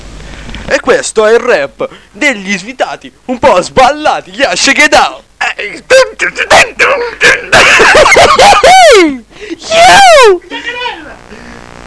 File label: rap